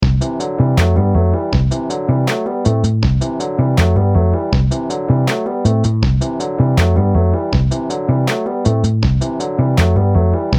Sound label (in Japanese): ぐるぐるビート; vlog sound